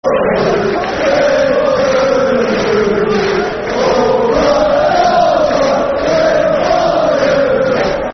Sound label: Football Chant (Soccer Songs); Celtic; fanchants-com